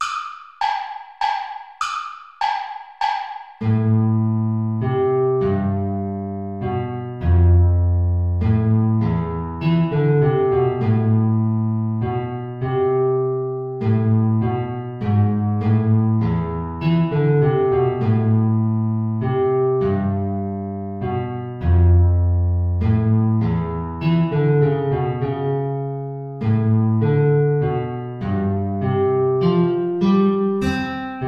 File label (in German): Menuet (Querflöte & Gitarre) (Play-along); BWV Anh 115